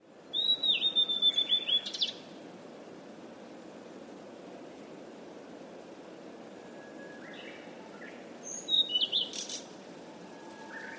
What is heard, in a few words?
ooruri, bird